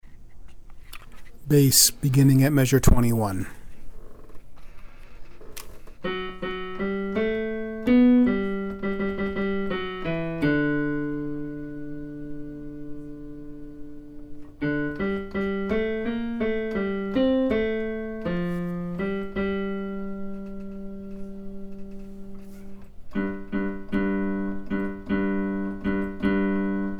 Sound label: Like a Deer bass